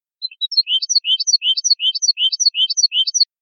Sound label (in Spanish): mecida